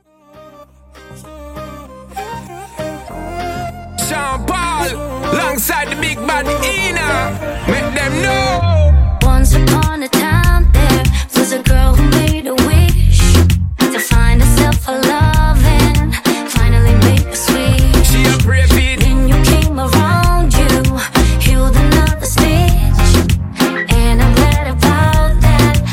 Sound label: Up Reggaeton 98Bpm; Remixfreakz